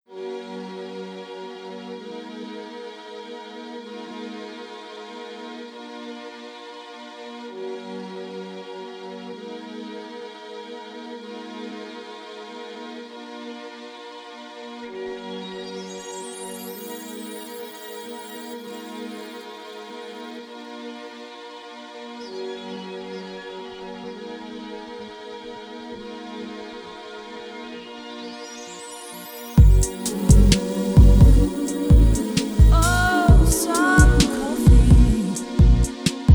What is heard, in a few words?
vocall chill 432 hz